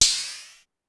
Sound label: Ride 808 Up Slow, Pitch Env Various, Clavia DDrum; Clavia DDrum-AT